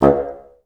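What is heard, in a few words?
staccato C1, Advanced Orchestra; Best Service; Peter Siedlaczek's Advanced Orchestra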